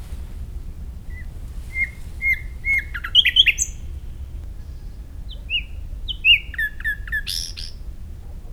nightingale common Luscinia megarhynchos

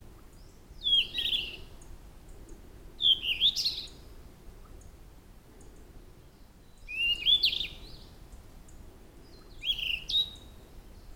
flycatcher yellow-rumped Ficedula zanthopygia

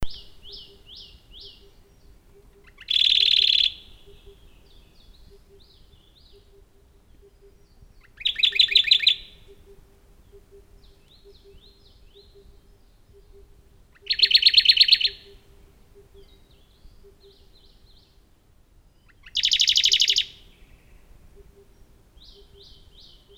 warbler radde Phylloscopus schwarzi

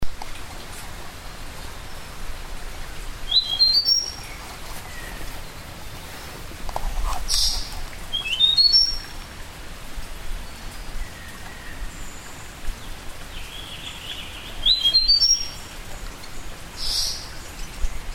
warbler yellow-bellied Abroscopus superciliaris

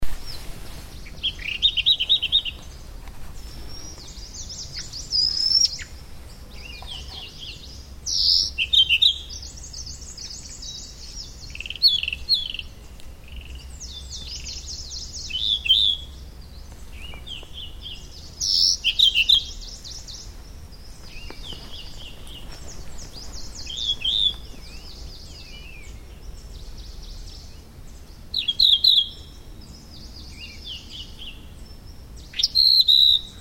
firethroat Calliope pectardens

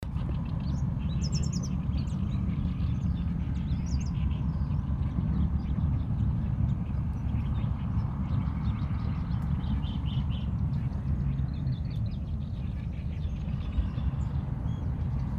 warbler alstrom Seicercus soror

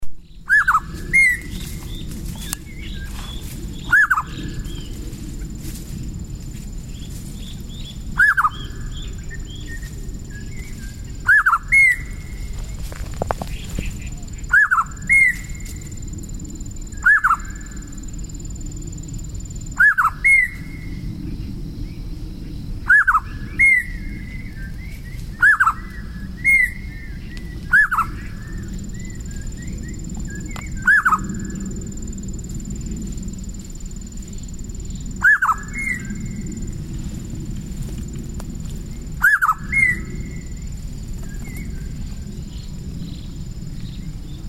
babbler grey-sided scimitar Pomatorhinus swinhoei; Grey-sided Scimitar Babbler is endemic to southeast China.; Mark Havenhand

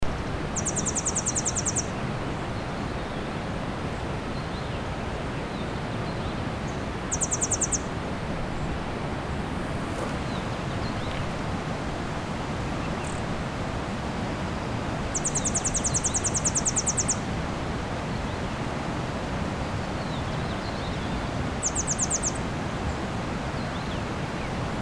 warbler sichuan leaf Phylloscopus forresti

